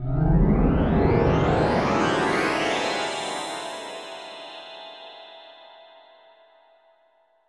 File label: AMBNT, 160, ambient